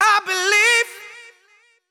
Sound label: deep house male, 125, A, vocals